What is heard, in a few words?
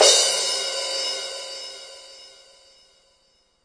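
Yamaha RS7000 hihat cymbal, Drum machines; Yamaha RS7000 Cymbals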